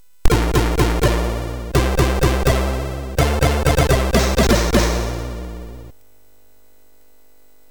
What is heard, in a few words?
Jingle, MOS6581; MOS6581R3; hvsc